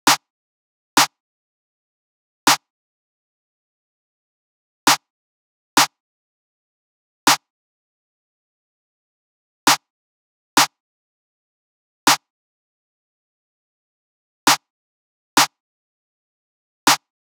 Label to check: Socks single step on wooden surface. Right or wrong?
Wrong